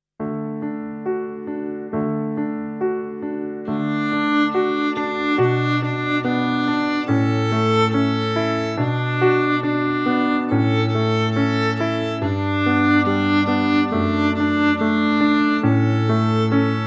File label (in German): kleine improvisation